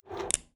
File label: conveyor mono; Dokobots